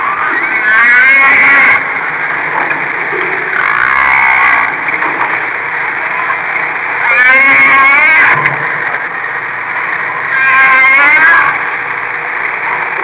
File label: Humpback whale sound